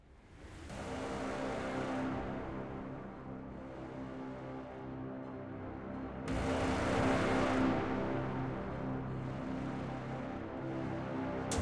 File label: Dungeon; throne quest deluxe